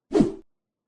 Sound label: coins clinking; Foley